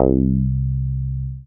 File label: BASS MONO